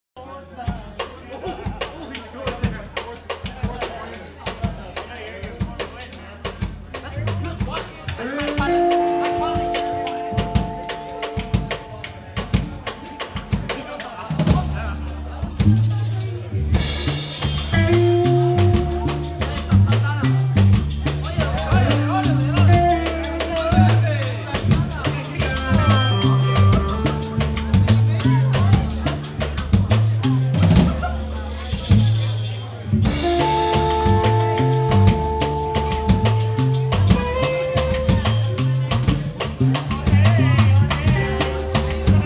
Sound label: Bembe Blues